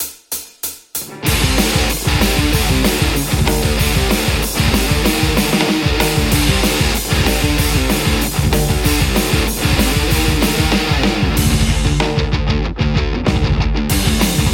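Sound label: metal Telecaster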